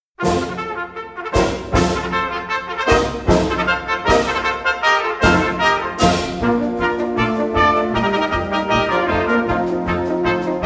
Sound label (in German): Bravourstueck fuer 3 Trompeten